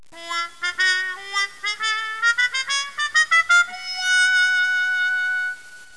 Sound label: WA WA WAH